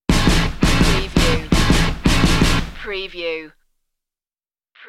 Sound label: indie party stinger